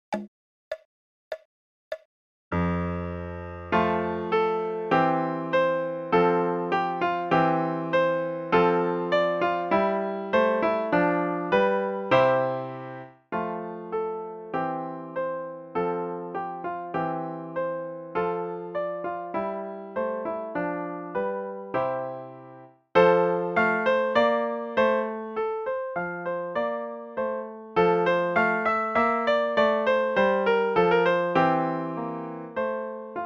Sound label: Harmonious Blacksmith; G.F. Handel